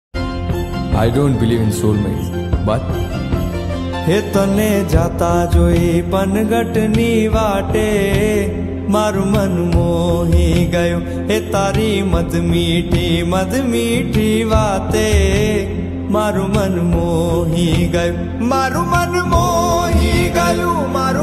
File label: maru man mohi gyu song